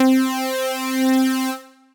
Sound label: String Machine; Synth Pad; Ableton; Factory Packs; Synth Essentials